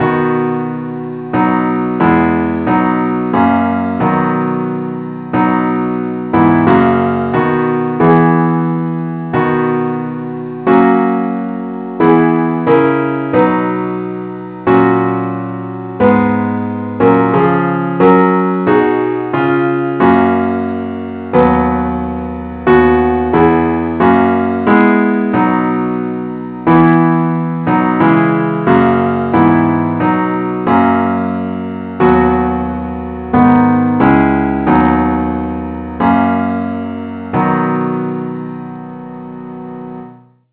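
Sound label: parts only keyboard; christe Sanctorum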